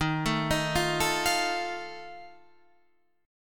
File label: Eb Suspended 2nd Flat 5th Eb Guitar Standard; Ebsus2b5 arpeggiated; JGuitar